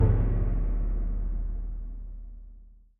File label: Gong